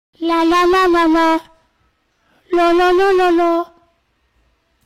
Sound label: lalala lololo; flamingo